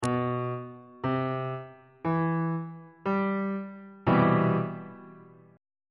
d double flat major 7th 3rd inversion on bass clef